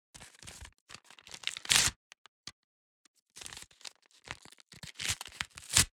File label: paper tear off